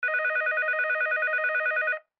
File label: voice dialing